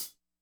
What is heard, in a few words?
hihat, Acoustic